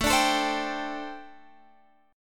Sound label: G Suspended 2nd Suspended 4th